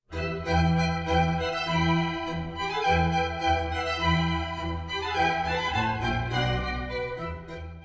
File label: vivaldi maj2min